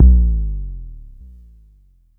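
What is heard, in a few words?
Long bass drum, Jomox Airbase99, Drum Machines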